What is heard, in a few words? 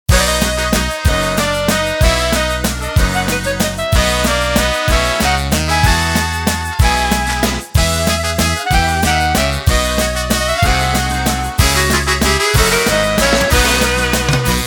Oberkrainer Waltz